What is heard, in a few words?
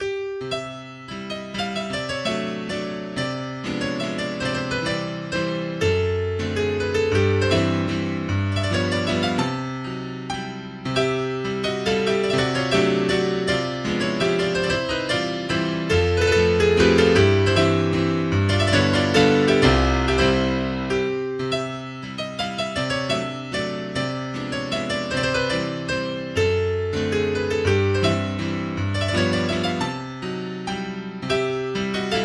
Mazurka in C Major Allegretto